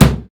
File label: 00s hot snare drum c key